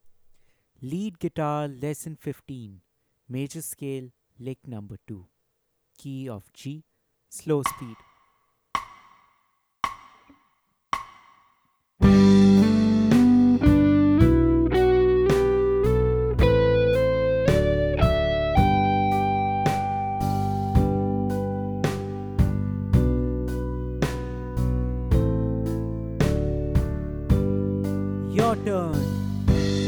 Major Scale lick Playthrough Slow Speed Key of G, lead guitar; The Art of Lead Guitar; Major Scale lick 2